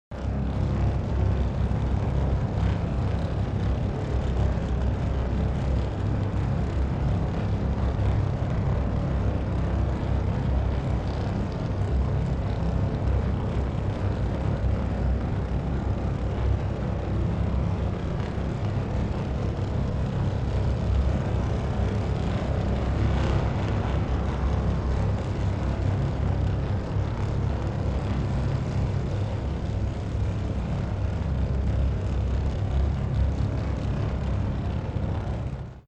Industrial Ambience Scifi Machine Room Generator Rumble; Steady; Factory; Backgrounds